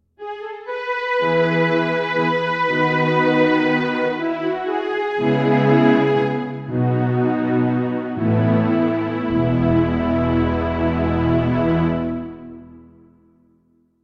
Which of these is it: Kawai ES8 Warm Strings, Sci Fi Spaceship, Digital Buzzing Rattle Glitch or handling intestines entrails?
Kawai ES8 Warm Strings